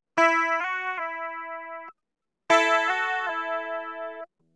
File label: GR55 Modeling